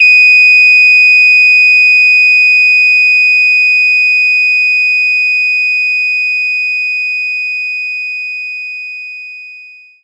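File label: French Horn mf D#6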